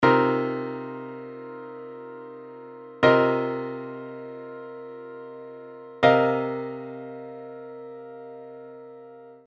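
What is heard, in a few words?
inversions, piano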